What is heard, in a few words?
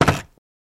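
Door Close Latch Movement; latches & bolts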